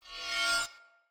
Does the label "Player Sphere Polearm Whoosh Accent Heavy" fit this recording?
Yes